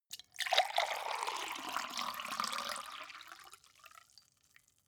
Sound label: glass pour hard; soundpool